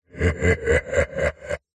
horror laugh male demonic wide heavily processed

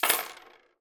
money coins handful down on table

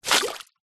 fishing net splash hit water fast

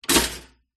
household toaster pop up; zapsplat

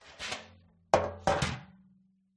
baking tray place on counter

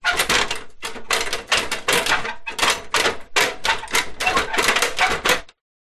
industrial step ladder metal rattle movement

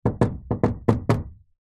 household knocking door wood slight rattle

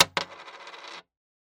Coin Drop Spin Wood

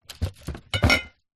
foley bottles wine in cardboard box movement

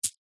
foley stones precious gems in pouch catch

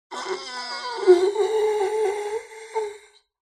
horror zombie monster or creature dying breath; zapsplat

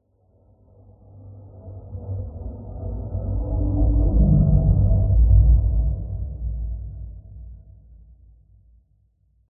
Transition Liftoff; PMSFX

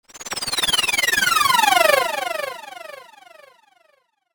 stutter sweep delayed descend

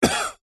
human male middle aged cough; zapsplat

